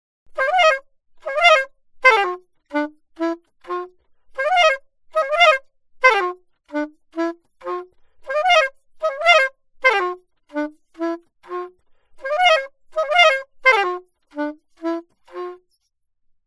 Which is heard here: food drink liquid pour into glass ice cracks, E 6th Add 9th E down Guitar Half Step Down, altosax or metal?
altosax